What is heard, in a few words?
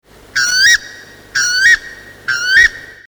Weka; Te Ao o Taonga Puoro